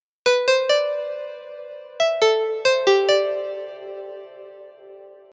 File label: Dream Keys